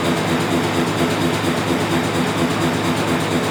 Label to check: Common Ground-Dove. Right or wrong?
Wrong